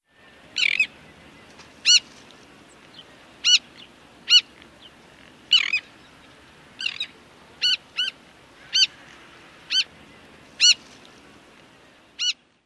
calls

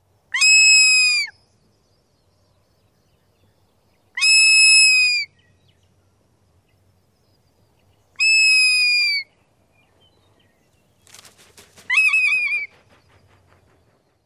call

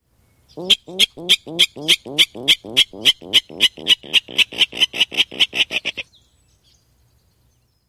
grunt display

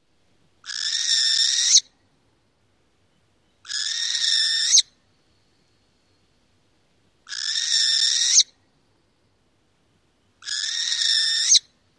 alarm screams; AK

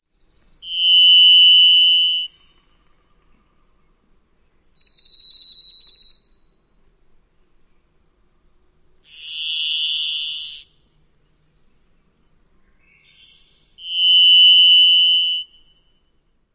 song

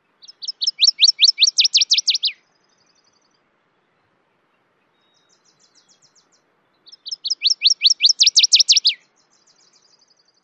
songs; AK

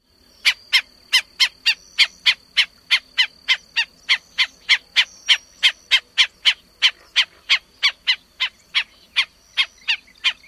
calls

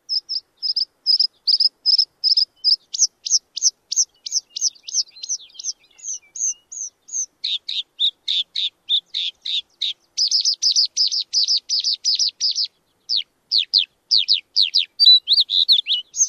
song

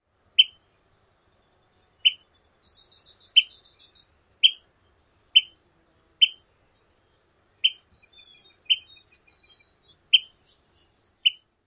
peet calls

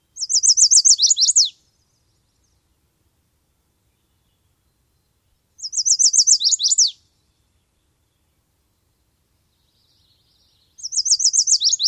songs; NY

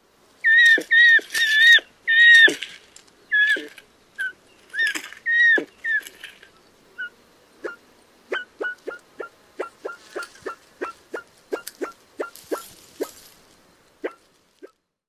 distraction display calls; AZ

